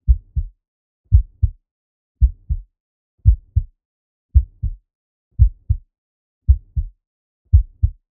Weird scary

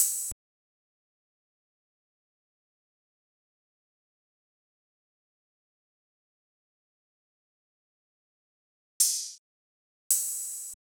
Space Drum Loop - 95 BPM Open Hat; Loop Stems; Cymatics

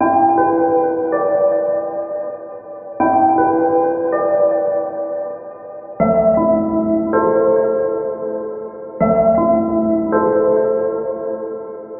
Vintage Upright Keys Em 80Bpm, Lo-Fi; Granular Musical Loops; Ghosthack Advent Calendar; Lo-Fi Kit

